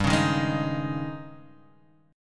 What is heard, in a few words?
G sharp Major 7th Suspended 4th, G